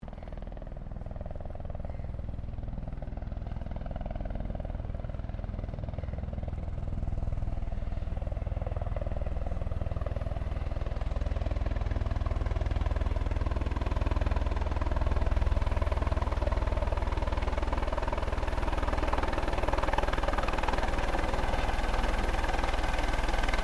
helicopter Eurocopter EC130 landing; Helicopters; Vehicles; Aircraft Collection

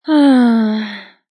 Female Sigh, A female sighs; Sighing

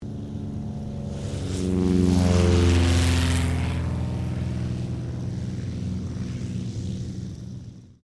AIRCRAFT CT4A TAKEOFF

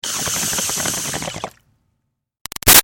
Bong Water Pin In Inhale Bubbles Short; Nature

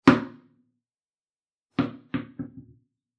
Tennis 1 Hit Then Ball Bounces Away; Sports; Andrew Fleming

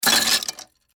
Wood Debris Foley Small Pieces Falling On Cement; Materials

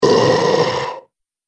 Zombie, horror; Zomby Sound Effects

